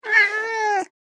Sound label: Stressed cat wail; animal